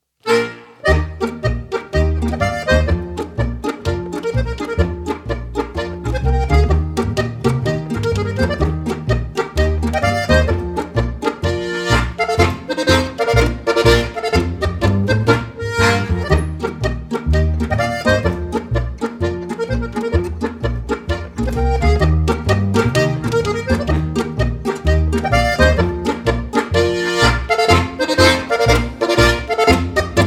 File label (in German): Polka